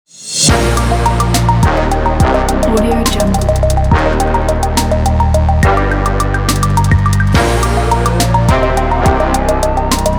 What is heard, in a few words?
sport intro logo